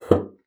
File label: BASSOON; Xsample VOL-6